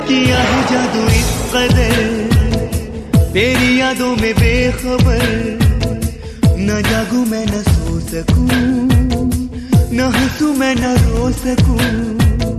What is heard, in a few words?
Soft Love Tone